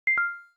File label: cam stop; LG; X Cam F690S